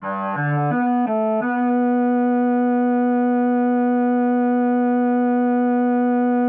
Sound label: bowed